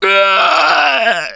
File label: Death Scream, Survivor Voice; Gambler; russian